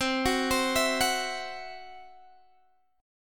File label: C Major Flat 5th, C, down, Guitar, Standard; arpeggiated; CMb5